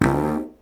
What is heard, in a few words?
fart poob